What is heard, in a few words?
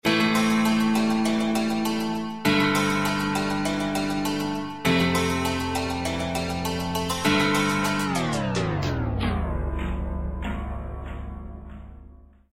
snd Oriental Techno down; Flashpoint Ultimate 13